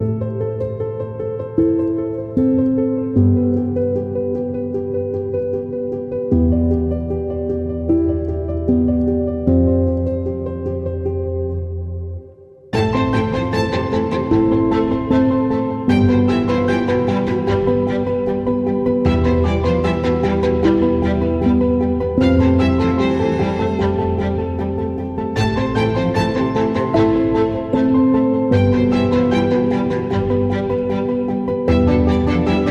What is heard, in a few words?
Mobile Ringtone Hopeful Piano